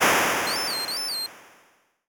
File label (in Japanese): hakushu